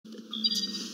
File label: hand link chime